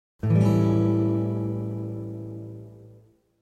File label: a dominant 7th